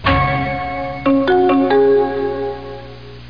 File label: Windows 2000 Windows Exit